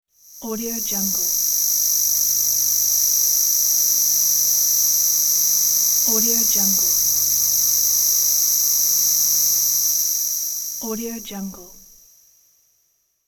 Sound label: electricity wave; electronplanet